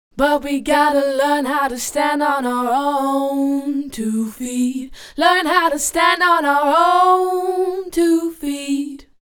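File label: dance vocals 120 double Bm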